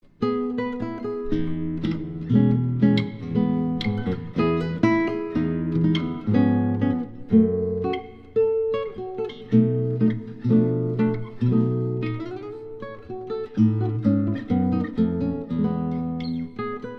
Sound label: schottish